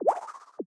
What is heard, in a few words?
drop start